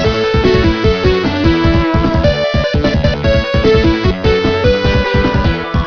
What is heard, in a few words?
music; sonic